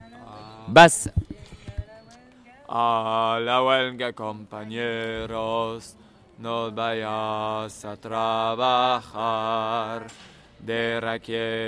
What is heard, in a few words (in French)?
basses; A la huelga